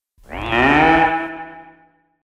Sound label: moo